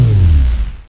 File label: force field down